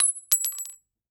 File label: Weapon Bullet Shell 7MM Dropping On Cement; AdobeCC